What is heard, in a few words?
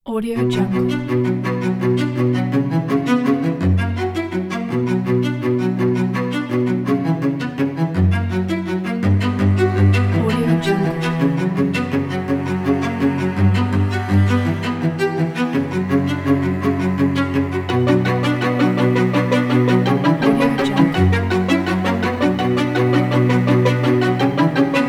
violin cello